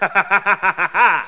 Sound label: laugh; Atari HQ Archive #1; Demo Man